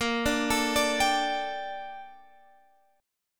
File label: A sharp Major 13th A sharp; arpeggiated